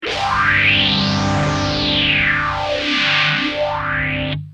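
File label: guitar sph323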